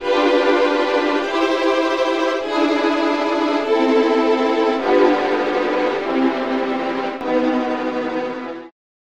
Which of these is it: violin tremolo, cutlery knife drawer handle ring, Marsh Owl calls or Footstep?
violin tremolo